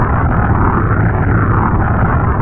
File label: fireball fly, weapons